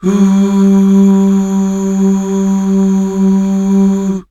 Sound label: UUH